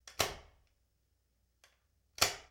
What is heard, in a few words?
Old Light Switch On Off; Sony Pictures Sound Effects Series; Period Devices